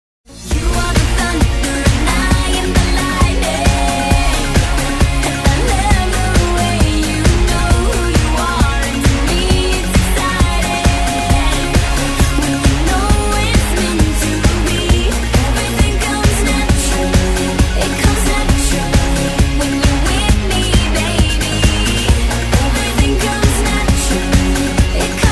karaoke; disney

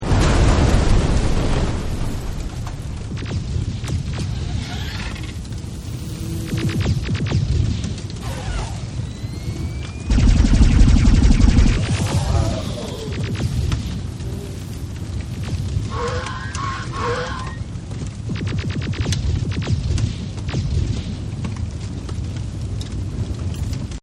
hero ambient; heros duty

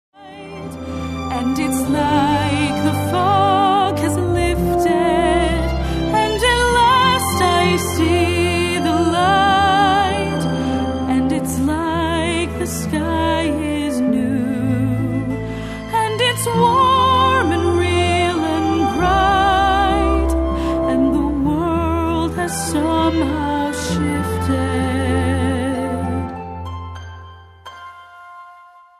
karaoke vocal; princess; disney

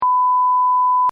emergency tone; the wild

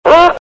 beaver; camp rock; rock n row

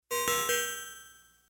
correct sound; toystory; mrs potatohead

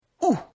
male hit; phineas and ferb; snowbrawl

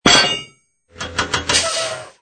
Crane Grab; disney; big crane game

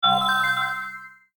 Game Over You Win; disneyxd; bryx

